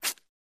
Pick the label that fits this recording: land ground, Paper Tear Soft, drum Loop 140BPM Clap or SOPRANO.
Paper Tear Soft